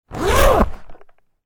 チャックの音 その4

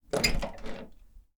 ドアを開ける; 日常生活的音效

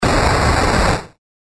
機械の動く音 中

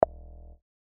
ポン コミカル

